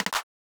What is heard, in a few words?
Pickup